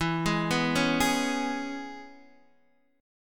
E 6th, Guitar, DADGAD

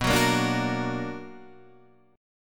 B Minor Major 7th, Guitar, Open D

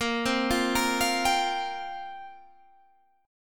F Suspended 2nd Suspended 4th, A sharp

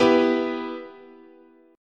B Suspended 4th Sharp 5th, guitar chord

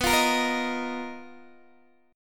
B Major 7th Flat 5th B down Guitar DADGAD

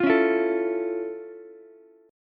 F Augmented Major 7th F Ukulele; F+M7; strummed

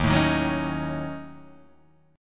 Eb Minor 7th Flat 5th, Guitar Half Step Down

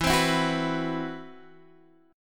C Major Sharp 11th E

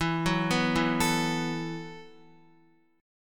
E Suspended 2nd Suspended 4th E, down, Guitar; arpeggiated; JGuitar

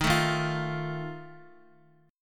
Eb Suspended 2nd Flat 5th, Guitar; strummed; chord; x,x,3,2,3,1; JGuitar

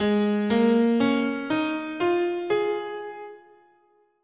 Yo, scale, guitar; Gsharp

